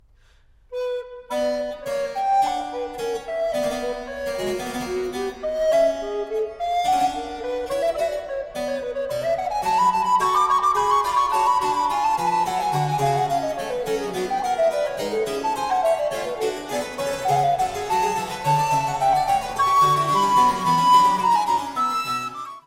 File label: Flute sonata Presto; BWV 1030